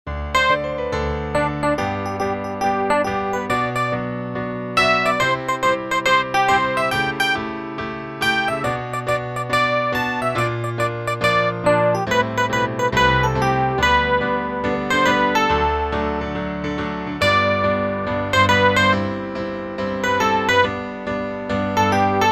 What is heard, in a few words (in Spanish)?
REFE PIANO; tono 1; audición; piano solo; convocatoria a audiciones el mago de oz